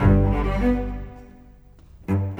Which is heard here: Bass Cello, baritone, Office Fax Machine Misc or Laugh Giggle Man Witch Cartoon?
Bass Cello